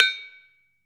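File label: HIGH BAR, Metalophones, Pitched Percussion; E-MU Producer Series Vol. 7 – Old World Instruments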